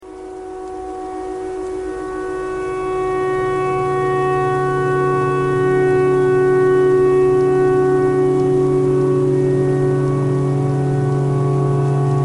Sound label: tornado siren tornado siren thread simple